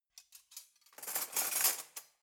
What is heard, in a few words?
cutlery knife drawer rattle